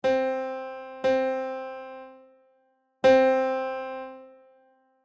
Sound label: music intervals prima unison